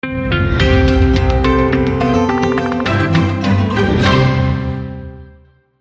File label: JINGLE